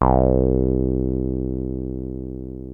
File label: 303 C2, TB 303, Synthesizer; Hollywood Edge; Giorgio Moroder Rare Synthesizer Collection